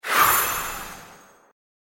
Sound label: copy move